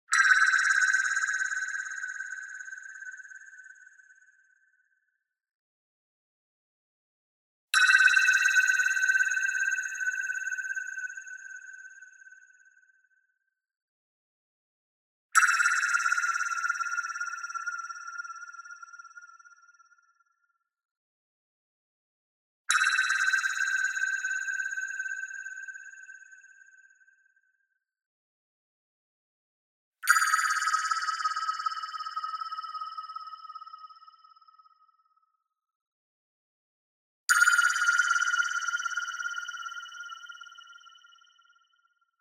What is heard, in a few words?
BLING Piano Metal LFO; boom library; Anime Essentials